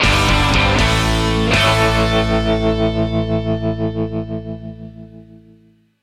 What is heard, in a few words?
Complete